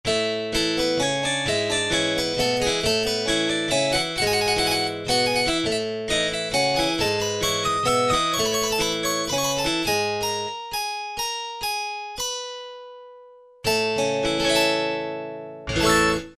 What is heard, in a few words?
Grand Hpsic; Korg MSC-05S Piano